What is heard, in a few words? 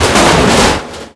Blow Roof